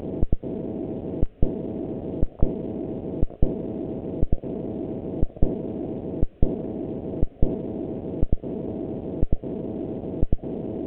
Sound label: DCF77 mod AM 77.5kHz; VLF